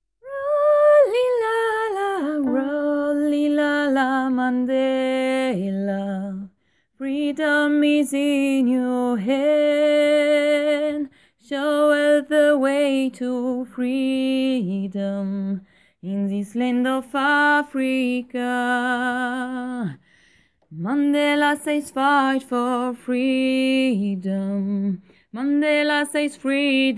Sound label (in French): Freedom tenor